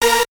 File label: synth